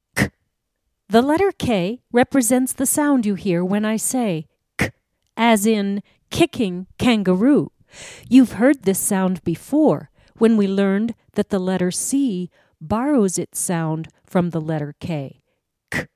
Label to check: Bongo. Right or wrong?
Right